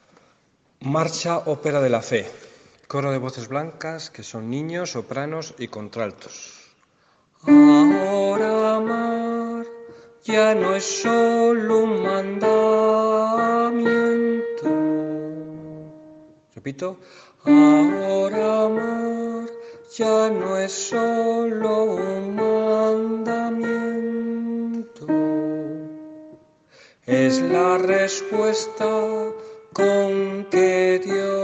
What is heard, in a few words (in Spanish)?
voces blancas; Marcha. Ópera de la Fe